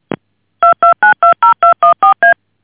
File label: DTMF